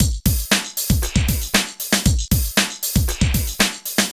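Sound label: drum loops, right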